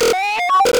mine predetonate, roller; hl2

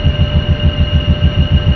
dropship on ground loop, combine gunship; hl2

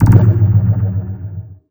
gunship ping search; hl2

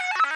radio random, prison; hl2

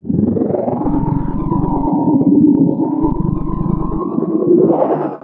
health charge, vort; hl2; npc

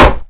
wood solid impact bullet; hl2

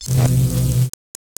physcannon claws open

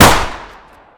target pistol fire; weapons

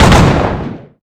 shotgun secondary; Sourceforts2.0; weapons

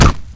shell impact, antlion

